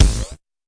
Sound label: fx drum; Electro FX